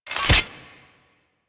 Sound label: Drone Blade Hit; Weapons